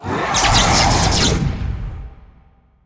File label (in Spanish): saurian boss muerte